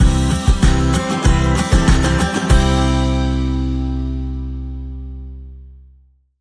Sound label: Bumper